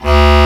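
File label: Bass Clar mf D1; Digital Sound Factory Virtuoso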